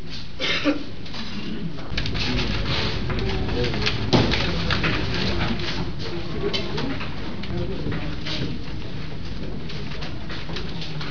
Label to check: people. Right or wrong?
Right